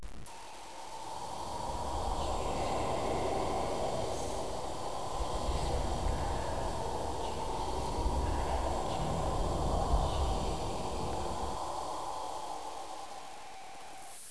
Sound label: ambience